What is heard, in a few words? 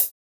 TOP CHH 1, Drums; Drums In Your FACE!; FILM KIT2